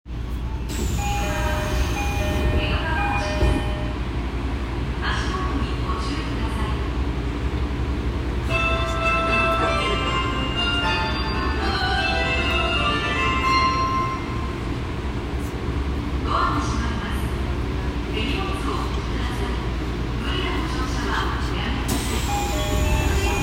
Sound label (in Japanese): 有楽町線江戸川橋東, train sounds